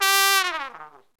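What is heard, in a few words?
trumpet long fall; OMI - Universe of Sounds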